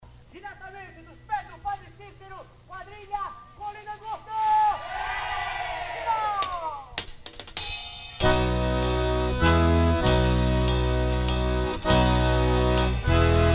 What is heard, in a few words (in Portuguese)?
quadrilha arastape junino